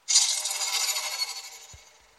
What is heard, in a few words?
Xbox Off